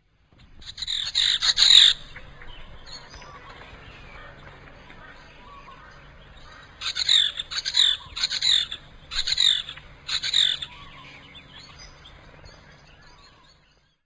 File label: forest kingfisher